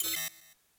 button upgrade click fail; lobby; strawberry

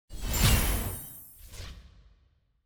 brackets match win; clash; lol

